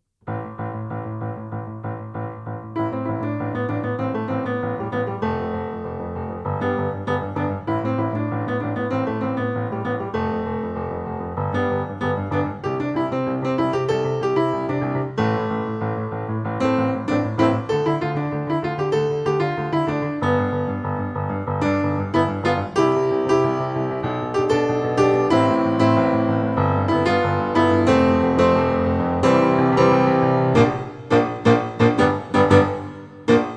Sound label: disco